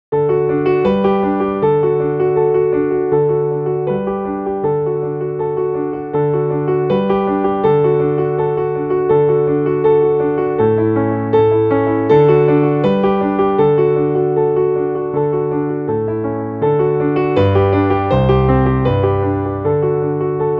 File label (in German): Playalong Klavierbegleitung